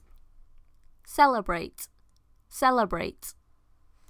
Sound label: celebrate